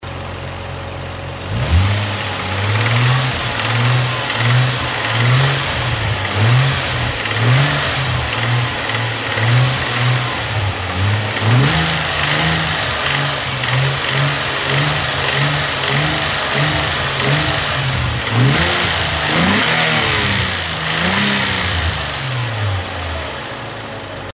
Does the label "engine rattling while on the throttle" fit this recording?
Yes